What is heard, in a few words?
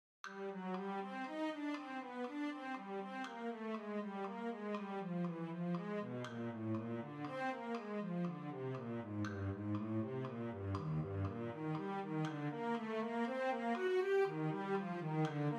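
Cello, Symphony Orchestra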